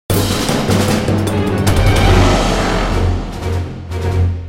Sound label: Fight; music; Silas; The Da Vinci Code; XBOX